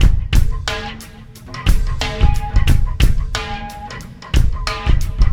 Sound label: Landing Party Beat, Processed Drumkits; Sonic Foundry (Sony Creative Software); Zero Gravity Beats